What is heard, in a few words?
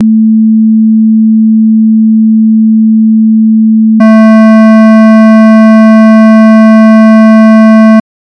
sine